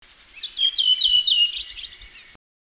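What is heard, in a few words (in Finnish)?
Turili, Äijänsuo. Alussa kaksi erilaista tavua, joita seuraa kolme samanlaista, koko sarja laskeva.; Gubbamossen; Espoon - Helsingin raja-alueilta alkukesästä 2008; Linnut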